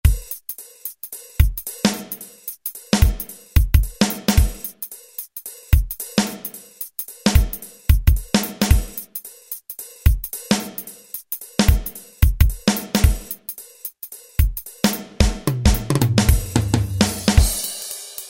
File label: Jazz, Alesis SR-16; Preset Patterns